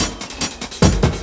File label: LOOP SD; Ueberschall; Drum & Bass Resonance; 145 BPM